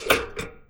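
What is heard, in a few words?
MECHANIC, household; AKAI Anime Sound FX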